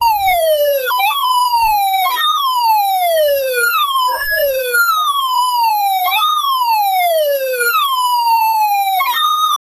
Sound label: uplifting acoustic guitar, folk